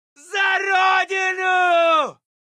charge, Voices Emotes, ru

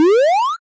player heal, Arcade; civ13; civ14

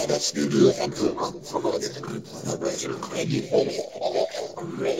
abductor

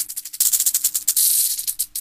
rattle, Toys; civ13; civ14; Items

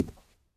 carpet, Footsteps

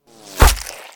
bullet hit, Gore

